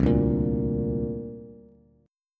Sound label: C 7th Suspended 2nd down Bass; C7sus2 strummed; C7sus2 chord; JGuitar